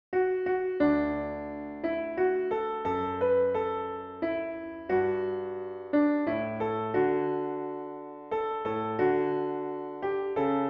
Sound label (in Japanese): ピアノ